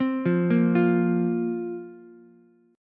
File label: Bb Suspended 2nd Bb down, Ukulele; arpeggiated